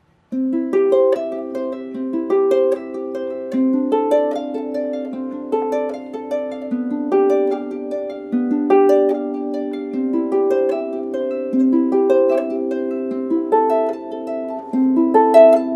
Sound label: Prelude in C, harpist